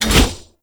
Impale Cast; Warframe; Hoplite

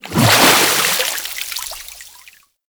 Pirate Kraken Tentacle Water Splash; Warframe

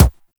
Weapon Punch Pistol; Warframe; Weapons

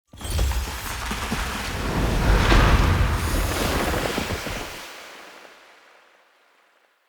Statue Deactivate A; Midrath; Champion Hub; Fenn Jotar

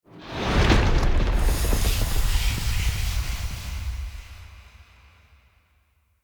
Door Open; Death Champion; Enemy; Ashen Spirit

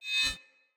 Spear Whoosh Accent; Weapons; Player

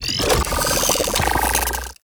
Water Sprayer Reload; Warframe; Weapons

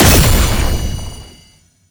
Lunge Impact Perfect; Warframe; Oraxia

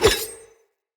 Dagger Melee Whoosh; Weapons; Player; Swords

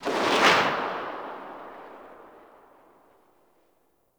Pistol Tail; weapons; Warframe; Grineer; Orokin

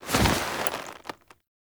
Finisher Foley; Warframe; Kuva Lich; Finishers

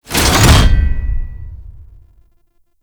Library Floor Gate Stop; Warframe; Duviri; Library Island

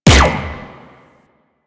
Corpus UMP Shot; Warframe; Weapons

